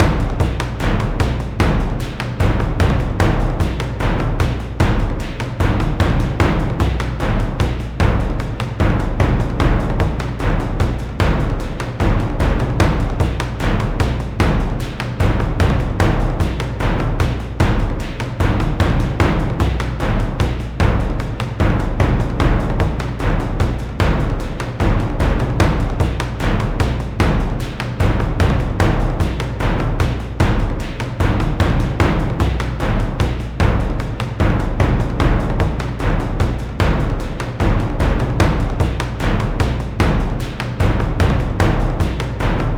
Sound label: Suspense